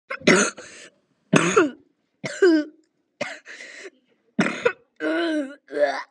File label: kid dying